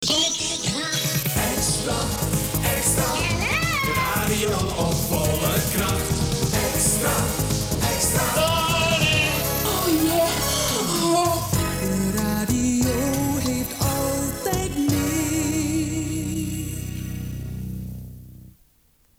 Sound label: jingle; X-traFM